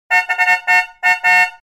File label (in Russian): gudok multash